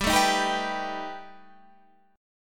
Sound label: B Augmented 7th G